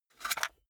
cassette case take out medium; objekte; kassette

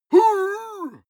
male fight moan; schreie; menschen; soundpool

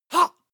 male attack shout short

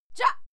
female fight scream short

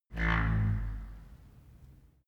wood door old creak low

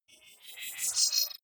wobble swell robot

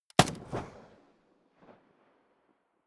rifle ak-47 exterior fire single distant; comedy-cartoon; Pistols and guns; pistolen-gewehre

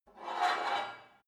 take off weight barbell quick, fitnessstudio

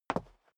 step dress boots concrete single stair up; menschen; schritte

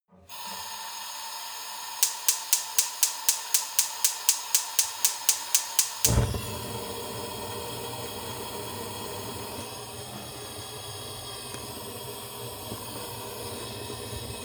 gas stove hob ignition struggle; berufe

